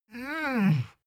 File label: dog whine low defiant; natur-tiere; hunde